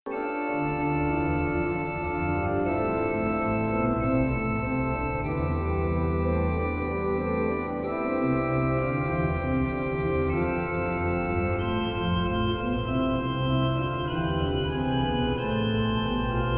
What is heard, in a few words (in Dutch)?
Orgelvers